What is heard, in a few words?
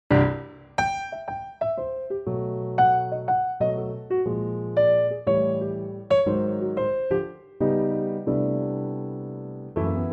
C piano